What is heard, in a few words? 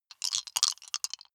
big three rattle in hand closed short